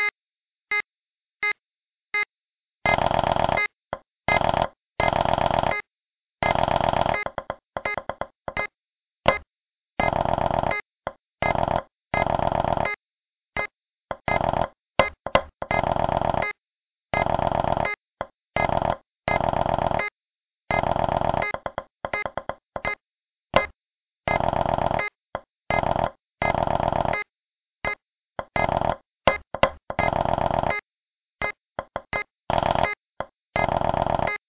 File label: Snare Basic